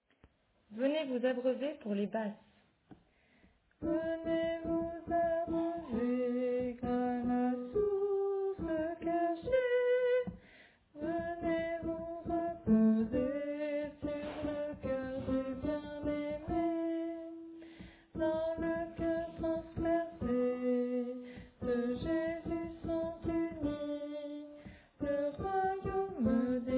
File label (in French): Basse